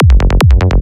sequence kick melo 148 bpm

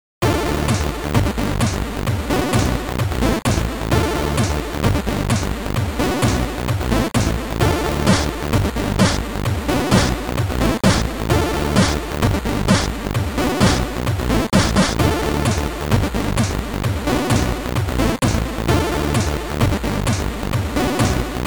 c64 drums

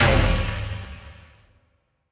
slam

